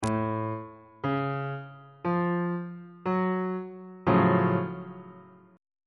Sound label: f sharp minor major 7th 1st inversion